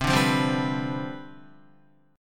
C-Major 7th Suspended 2nd Suspended 4th Guitar, CM7sus2sus4 chord; strummed; 7,5,5,5,7,7; JGuitar